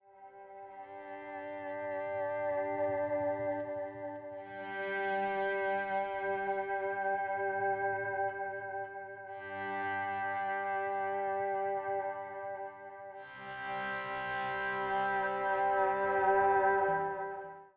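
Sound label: Country Guitar, Verse 1 Alt; Digidesign; Pro Tools Essential Loops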